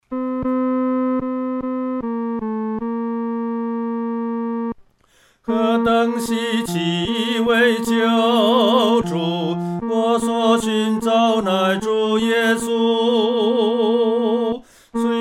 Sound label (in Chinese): 独唱 第三声